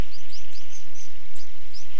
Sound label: directive call audible